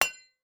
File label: hatchet strike; Rust